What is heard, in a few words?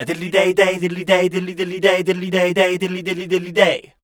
SCAT #F, Voice